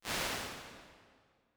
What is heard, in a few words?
Medium Hall, Lexicon 480L Reverb; impulse responses; Big Gee's